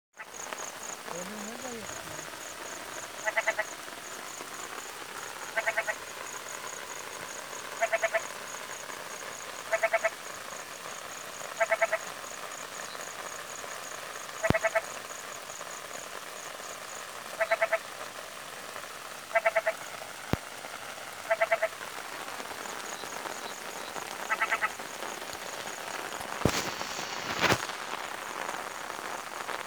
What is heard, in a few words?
Hoplobatrachus crassus